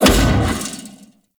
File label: Space Fighter Rocket Explosion; Dredger Rocket Explosion C; Warframe; Vehicles; RailJack; RailJack Enemy Ships; Grineer; Space Fighter Dredger Agent